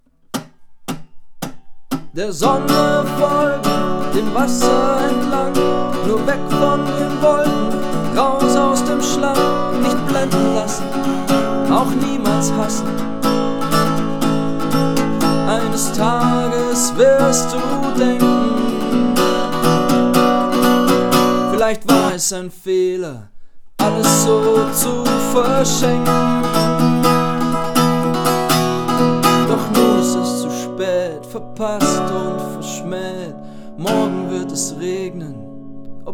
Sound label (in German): wetterwechsel